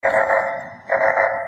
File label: alert; borg